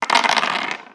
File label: Dice